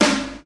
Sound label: phat snare drum sound c sharp key